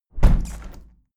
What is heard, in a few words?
window plastic squeak close heavy sequence